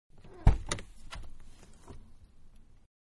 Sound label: Mid Size Car Door Open Interior; Vehicles; Car Sound Effects